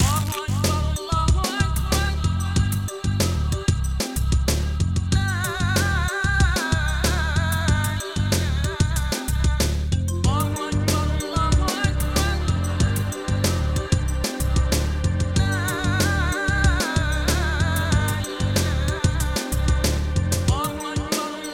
game over; OctaMED SoundStudio V1; mug-members; ben thomas; angkor

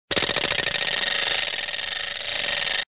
cranking motor

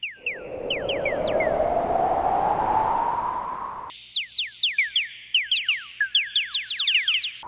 Sea, sfx; FRACTIONS PIZZA

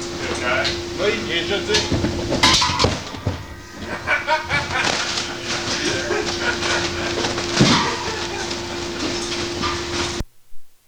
hahahaha

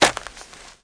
fall on dirt; Immortal

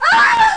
wolfman err

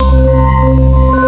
armor up; Bzone

